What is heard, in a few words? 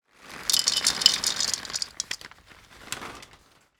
Pouring Dry Cat Food; افکت صدا حیوانات; Animals